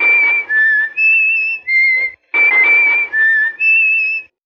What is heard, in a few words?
keys whistled, Phonky loops